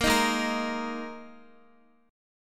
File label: Ab Suspended 2nd Guitar; strummed; Absus2; x,x,8,8,8,5